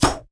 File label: Primal Shoot